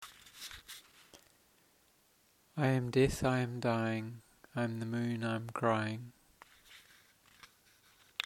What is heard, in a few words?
moon crying